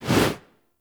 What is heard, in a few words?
Generic Weapon Aim Transition In; Warframe